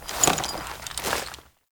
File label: Horse Foley Dismount; Vehicles; Foley Actions; Warframe; Duviri